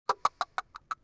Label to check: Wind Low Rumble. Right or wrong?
Wrong